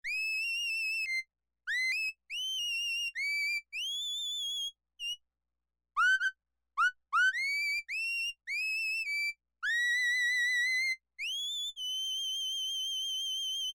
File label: whistle, Korg M-500 micro-preset